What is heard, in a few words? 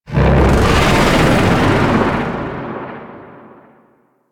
Void Dragon Vocal Ability; Warframe; Duviri